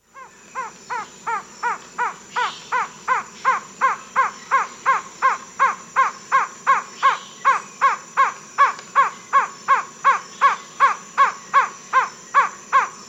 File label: Slaty-Tailed Trogon; S. America